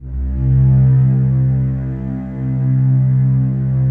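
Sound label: PAD, atmospheric pads; Best Service; XX Large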